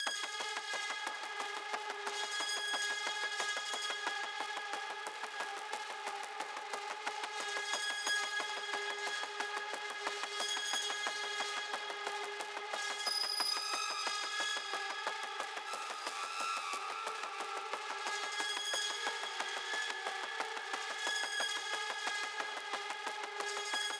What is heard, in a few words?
Action, Loop, 180BPM, Classic Horror Sci-Fi Music; Dead Ark; Classic Horror Sci-Fi Music Pack; Action 3; Alter C